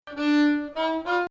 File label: Bayati, Maqam Bayati; Bayati 1